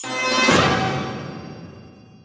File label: fail war; 장원전